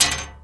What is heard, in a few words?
metal; Mafie 1